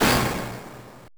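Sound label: Orchestra Hit; Genesis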